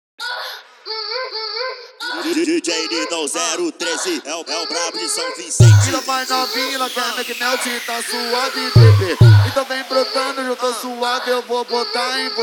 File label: funk